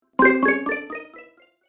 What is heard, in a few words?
App Delay, ping; multimedia